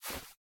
Cloth take; miside demo

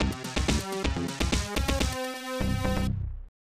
Clear Level; The Jungle; Corn Buster; SNES

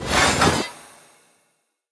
Elite Footman Improve; Linirea

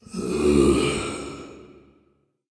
Sarcophagus Mummy moan

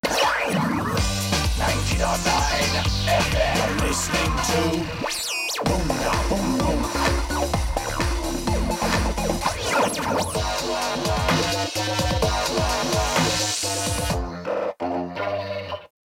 Radio Car Electro Jingle; gangstar rio city of saints

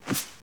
seat people; SpongeBob Diner Dash

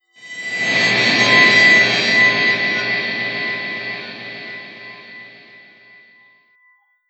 ghost metallic woosh + metallic glimmer; HellSign; WinBoss

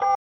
button

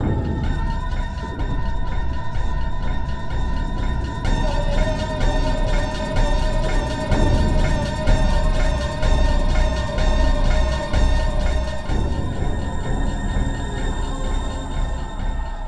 sounds music

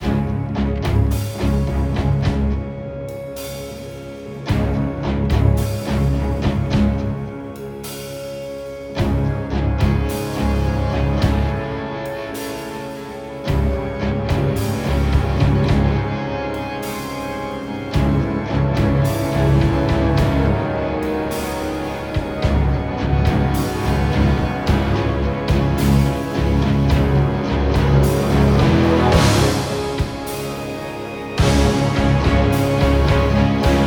action low loop; heroes of the storm full gamerip 2015; towers

